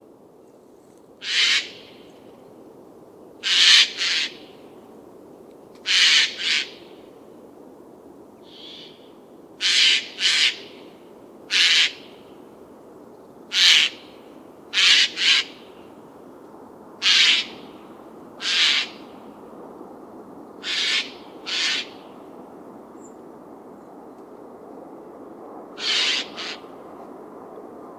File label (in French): geai appel